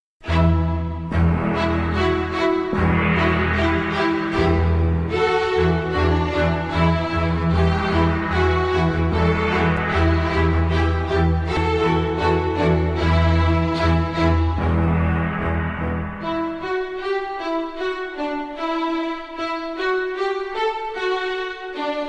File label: dragon slayer string orchestra